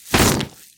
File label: Web Shot; Lurker; Evolve; Gorgon